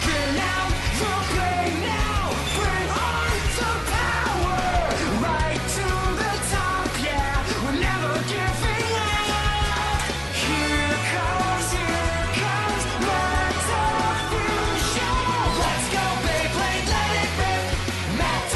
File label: metal fusion; children